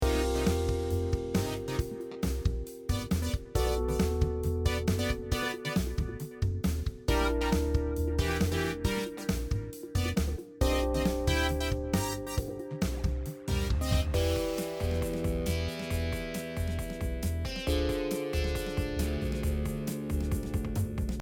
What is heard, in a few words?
loop